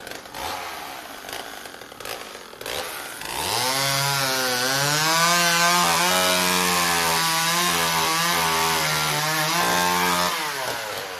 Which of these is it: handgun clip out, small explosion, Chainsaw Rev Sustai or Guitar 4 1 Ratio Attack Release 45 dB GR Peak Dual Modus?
Chainsaw Rev Sustai